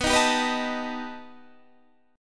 B Augmented 7th B down Guitar Standard; strummed; JGuitar

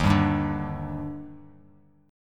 Eb Major 7th, Bass Standard; EbM7; strummed; JGuitar

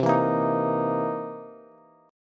C 7th Sharp 9th C Bass; strummed; JGuitar

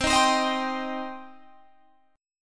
C Minor C down Guitar Open G

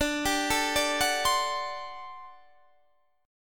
G Major 7th Suspended 2nd Suspended 4th

